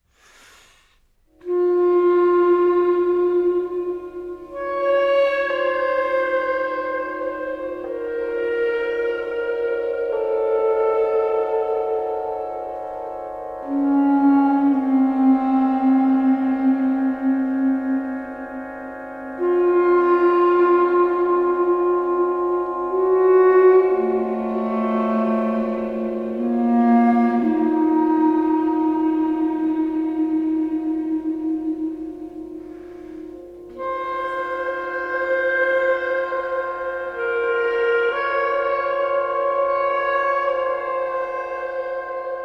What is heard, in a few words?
Clarinet